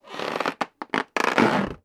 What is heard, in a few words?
foley balloon rubbing squeak; sound effects one